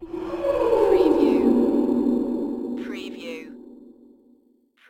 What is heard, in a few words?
FEMALE GHOST LAUGH